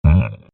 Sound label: eating sound; cruelty squad